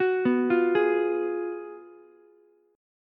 Gb Major 7th Suspended 2nd Suspended 4th Gb Ukulele; arpeggiated; 11,11,13,11; JGuitar